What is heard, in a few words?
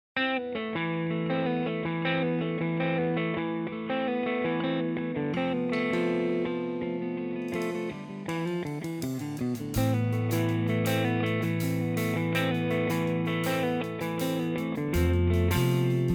backing track